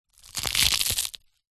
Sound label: gore small bone break crunch